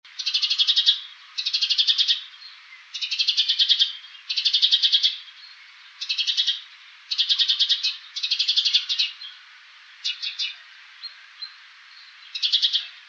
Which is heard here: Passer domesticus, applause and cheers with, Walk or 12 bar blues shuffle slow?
Passer domesticus